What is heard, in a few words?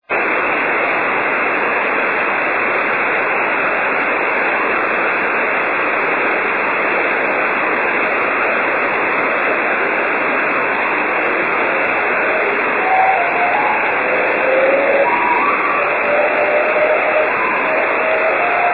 airplane; 5G jt65c; ドプラー音; 晴、気温7℃ 湿度25%; 飛行機・機体反射：羽田空港上空 WSJT/JT65C 伝播距離：50km; JH1UGF 横浜市; JN1AYV 茅ヶ崎市